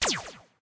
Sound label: fire laser; Adventure Time - Angry Betty; ThrillGames